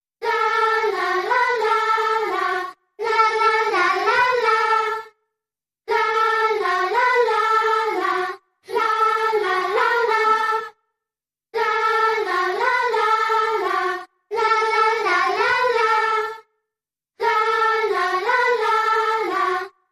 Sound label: Speeded Choir Only